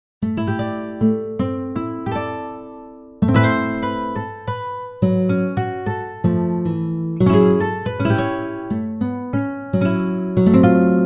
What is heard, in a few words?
JAZZY GUITARE